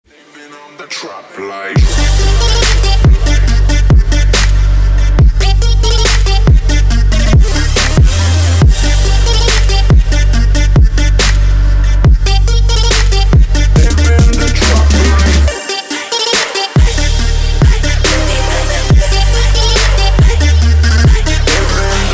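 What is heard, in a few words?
ringtones from trap genre for incoming call ringtone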